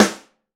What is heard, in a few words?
verby snare g sharp, Royality free snare drum sample tuned to the G# note. Loudest frequency: 1697Hz